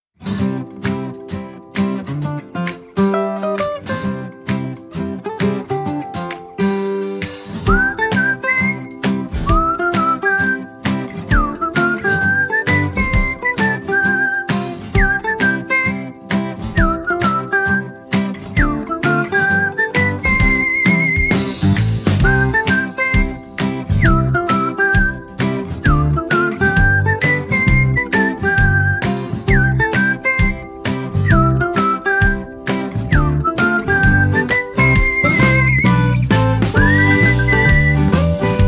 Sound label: whistling music; dolphin tunes